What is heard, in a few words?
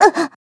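Damage, Korean; Yanne L; kings raid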